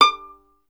STR VLN, Solo Instruments; Miroslav Vitous

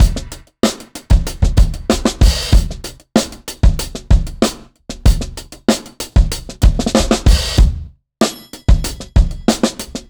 Brown Half-Groove 4-Bar, Breakbeats, Drum n Bass; KJ Sawka; Drum n Bass & Beyond

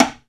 CLSD RIMS, Timbales; E-MU Producer Series Vol. 2 – More Studio Essentials; Composer; Timbales Xswitch

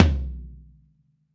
Drums Percussion

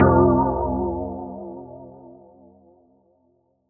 SYN, Synths

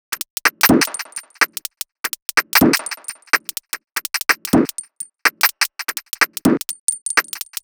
125bpm, House Drumloops

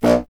C. Bassoon, solo instruments

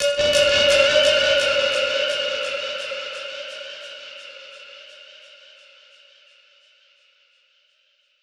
Perc Hit, dub percussion; musicradar

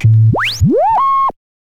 scratch short, Vinyl scratches

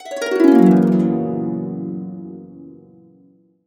Harps; USB Soundscan vol.62; AKAI

